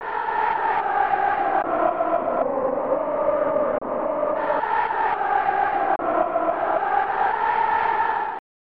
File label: Crowd Chanting; snes; Kick Off 3